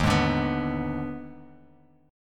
D sharp Major 7th Suspended 4th Sharp 5th D sharp Bass Standard, chord; strummed; 11,11,12,16; JGuitar